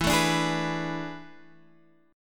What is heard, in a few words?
A Major 7th Suspended 2nd Suspended 4th E, chord